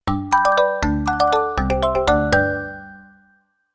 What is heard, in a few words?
Promotion, notifications; Meizu X8